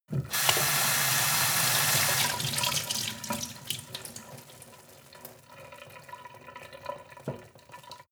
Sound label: sink water pot hard; industrie-wirtschaft; gastronomie